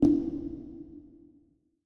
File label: wild land; rise of maya